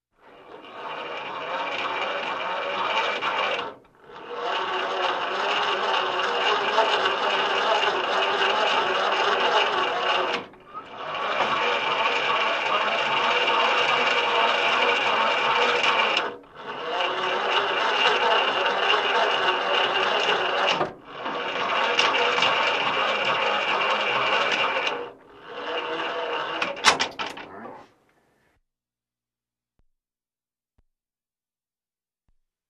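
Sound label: tank turret movement; Танк V5-58 движение башни; Звук танка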